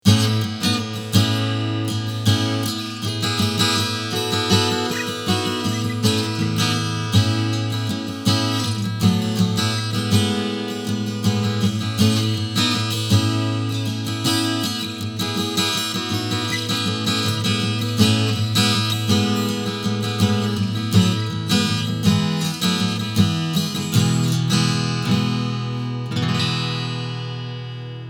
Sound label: Strumming Steg